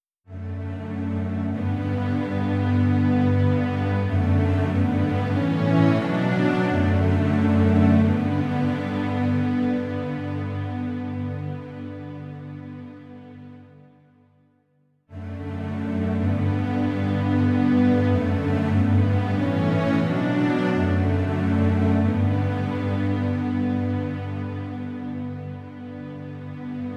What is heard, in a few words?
Large Strings; dexibell vivo s2